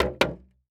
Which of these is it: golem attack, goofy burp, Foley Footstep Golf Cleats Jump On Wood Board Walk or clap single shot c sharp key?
Foley Footstep Golf Cleats Jump On Wood Board Walk